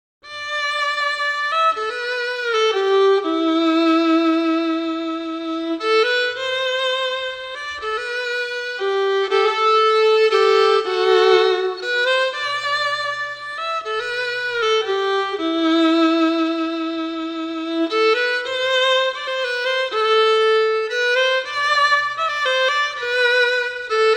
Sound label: Fiddle Version